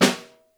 Snare Room; Ableton Foundation FreePacks; Snare Designer

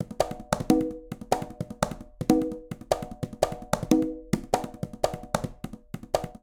Latin Jazz Conga 149bpm; Latin Percussion; Ableton; Factory Packs

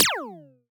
Alien FM Perc Short; Ableton; Noise Garden - Augmented Percussion; Alien FM Perc Vol. 1

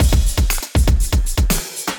Acoustified Kit, Drums; Ableton; Bomblastic; Acoustified Kits

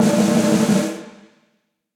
Snare Press Dark Plate Verb; Snare Articulation; Drum Hits; Session Drums Club; Standard Hits; Ableton

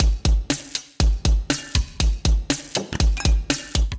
Toy Hip Hop Kit, Drums; Ableton; Factory Packs; Vibrations; ModeAudio

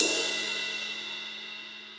Ride Edge Steely Tight; Drums; Drum Hits; Standard Hits; Ableton; Session Drums Studio

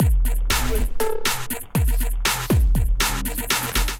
Flutter Kit, Drums; Ableton; Factory Packs; Skitter and Step

